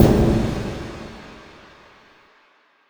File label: Impacts, Effects; Vengeance; Essential Clubsounds Vol.4